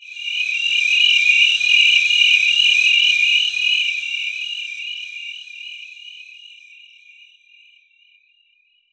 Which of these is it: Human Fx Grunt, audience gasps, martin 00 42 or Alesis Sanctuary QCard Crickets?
Alesis Sanctuary QCard Crickets